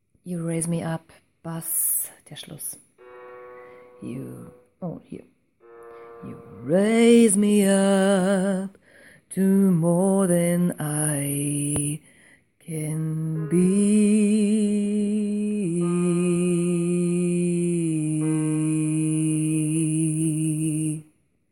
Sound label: Bass